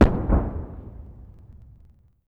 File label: distant gun 105mm howitser; WAR